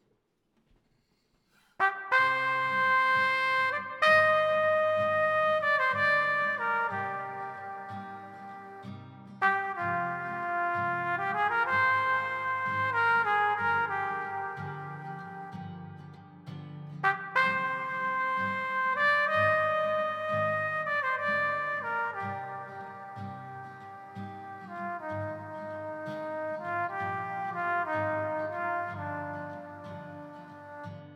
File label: Orchestral